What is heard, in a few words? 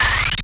FX Stutter Sweep; FX Hit; Drums; Ableton Live 12 Lite; Core Library